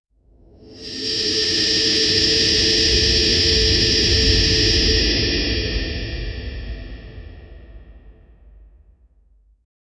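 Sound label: shriek shockwave a piercing; cathedral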